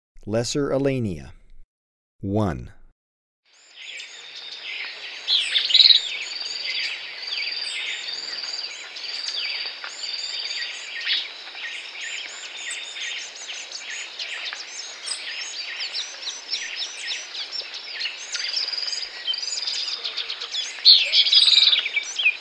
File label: Lesser Elaenia, Birds of Ecuador; S. America